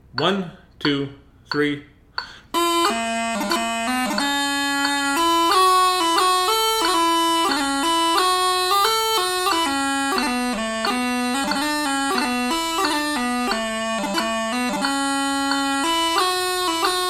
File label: 4/4 Set 90, Chanter Recording (Full Set)